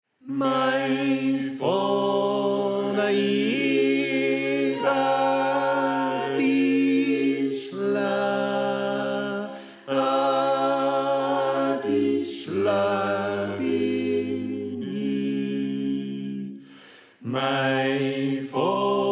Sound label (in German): Tenor